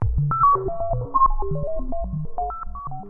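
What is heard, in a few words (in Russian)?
отправка важной информации звук из мультика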